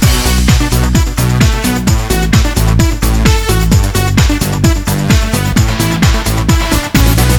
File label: game bgm; Avoider